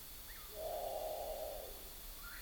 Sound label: Great Potoo